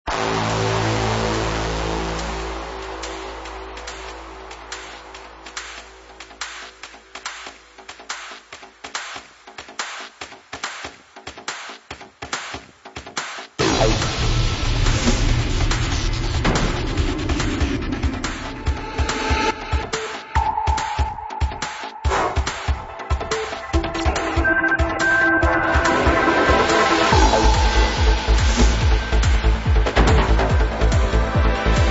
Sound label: Progressive Trance; Style: Psychedelic / Progressive; Label: YSE